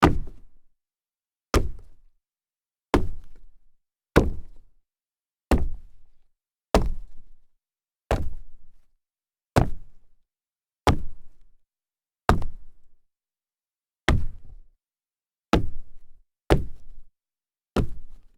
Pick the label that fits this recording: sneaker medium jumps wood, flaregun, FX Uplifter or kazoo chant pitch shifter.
sneaker medium jumps wood